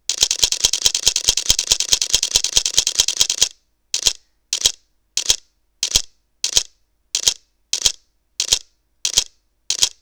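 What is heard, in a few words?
Sony Alpha DSLR-A900 continuous high RAW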